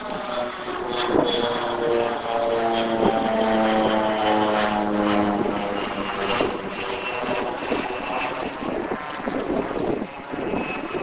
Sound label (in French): avion